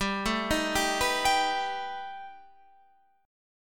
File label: B Augmented 7th G